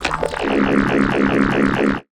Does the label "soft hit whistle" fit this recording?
No